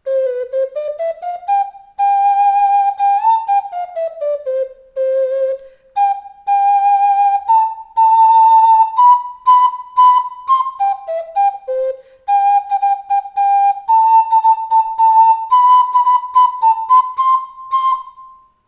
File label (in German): etwas Hall; Wenn die Bettelleute tanzen